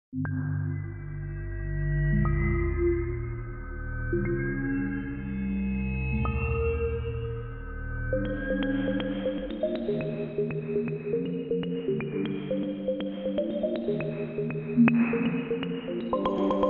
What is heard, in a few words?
Abstract environment